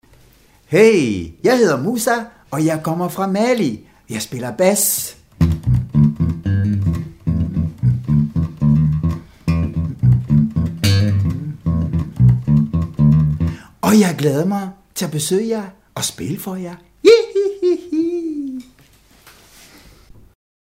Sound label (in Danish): instrument bas